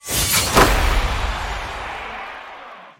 绝杀音效; 战中; nba

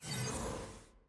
巡回赛页面打开音效; nba

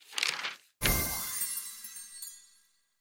邀请信打开; 邀请函; nba

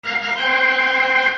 风琴上行; nba

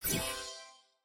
球员装备 装备升级光效; 升级 装备 技能; nba

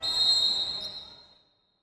比赛结束口哨; 战中; nba

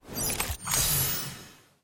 关卡结束 切换关卡; nba

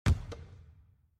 传球; 战中; nba

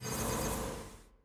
球员排名 列表刷新; nba

